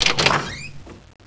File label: wood open; buro